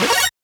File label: synth; osu